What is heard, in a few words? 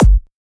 Dr Kick